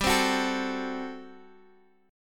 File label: G Major 7th Suspended 2nd Suspended 4th G; x,x,x,x,7,7,8,6; down